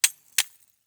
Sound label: Rollerskates, old fashioned, all metal, wooden wheels, clash, strike, clatter, ping, double; Eiravaein Works; Vaeyan IV